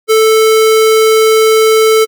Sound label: square wave